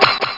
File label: SPLIT